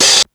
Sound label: Hat Open, Ensoniq Mirage